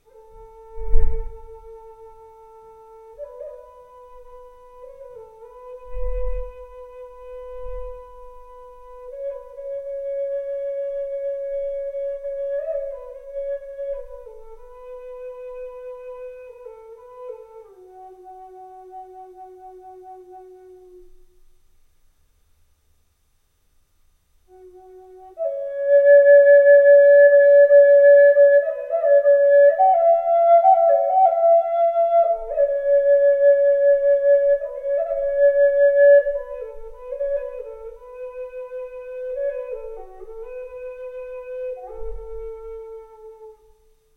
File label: silky oak in G